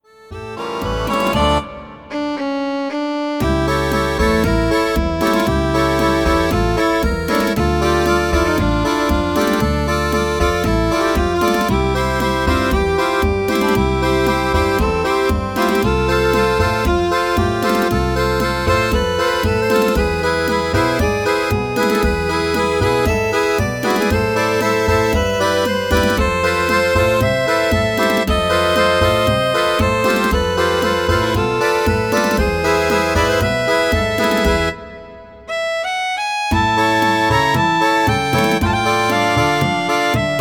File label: Tango Synth